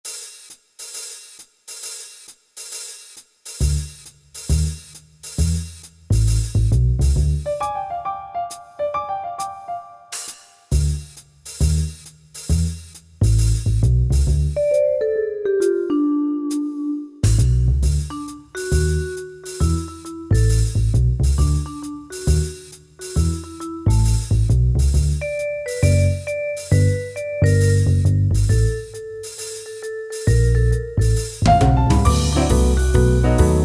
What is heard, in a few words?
instrumental